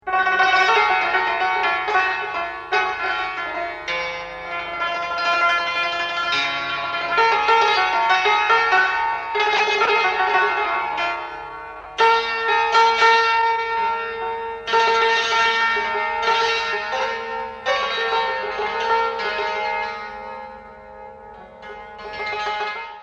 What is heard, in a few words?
santur